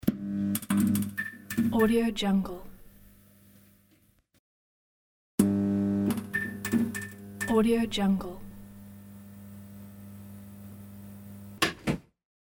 neon lights on

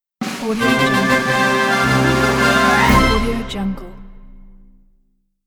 logo show sitcom open

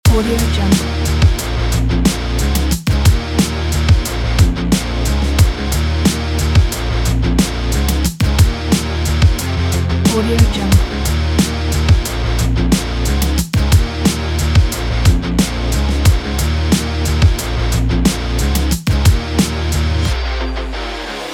breakbeat loop; playtek

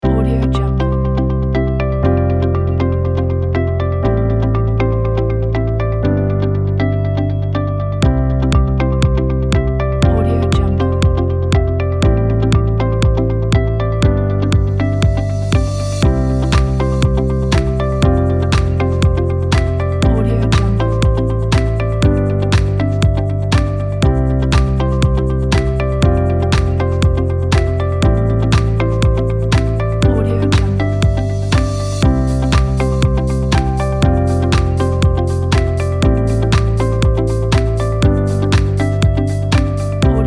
education background corporate

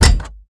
footsteps sharpy metabot; robotic lifeforms 2; soundmorph

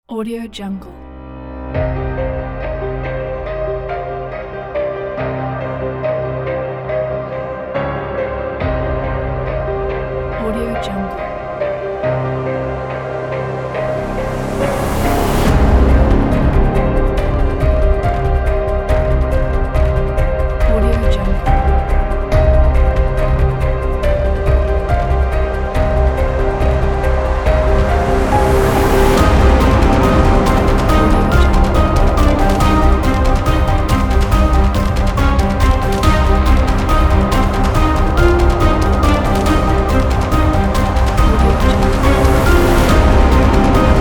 sinister cinematic trailer; soundmake

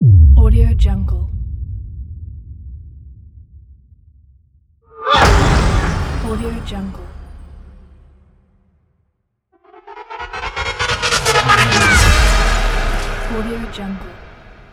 tense impact

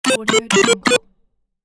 pinball game sound

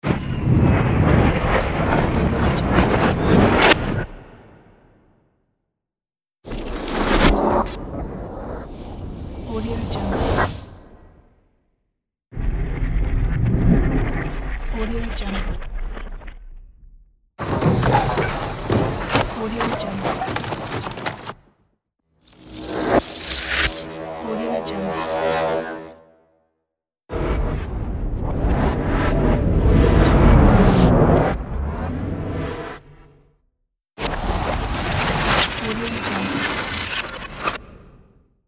scifi mechanical components pack

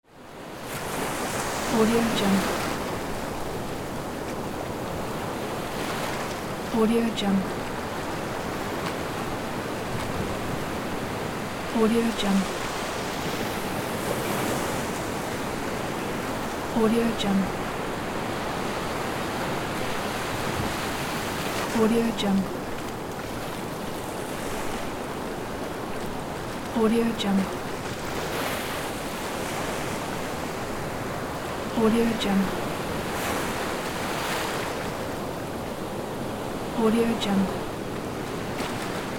ocean sound

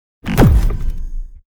impact titan boomer; robotic lifeforms 2; soundmorph

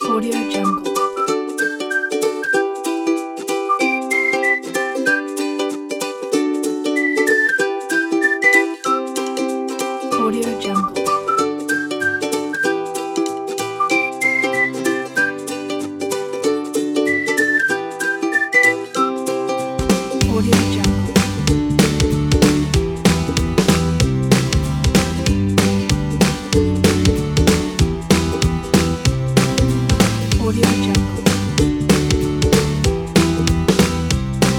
happy ukulele whistle

